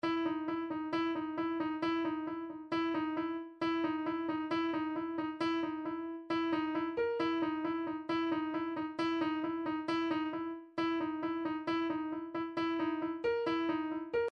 percussion midi files ride cymbals 067 ride cymbal; Stylus